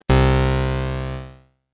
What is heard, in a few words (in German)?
klavier; emu workshop